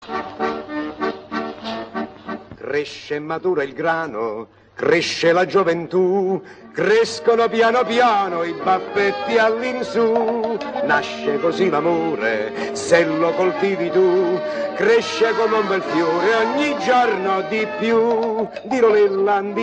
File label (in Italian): la mazurka